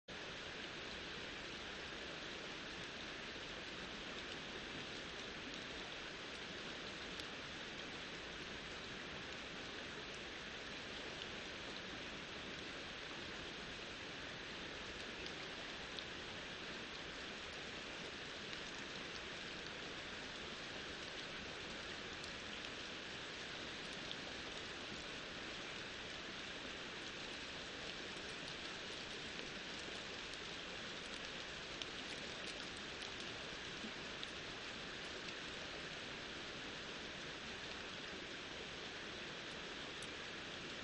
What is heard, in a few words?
riverside; Kyoto U; Ashiu